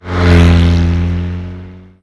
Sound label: Car Passby Med